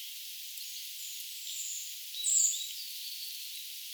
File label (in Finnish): tuollaisia rastaan aania